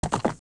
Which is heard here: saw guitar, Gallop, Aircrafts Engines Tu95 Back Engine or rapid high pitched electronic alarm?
Gallop